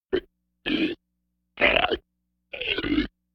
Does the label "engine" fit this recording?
No